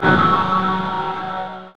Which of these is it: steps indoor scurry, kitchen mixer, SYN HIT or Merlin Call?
SYN HIT